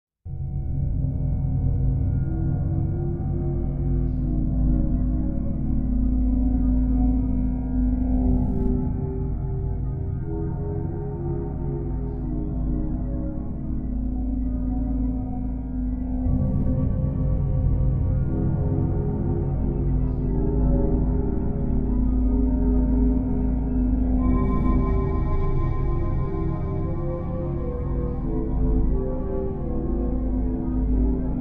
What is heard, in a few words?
Noise In A Flat